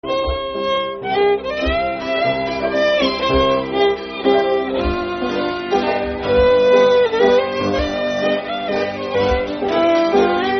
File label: Waltz; Brown Pick Waltz